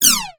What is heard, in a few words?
Space Gun; Sci Fi; 1001 Sound Effects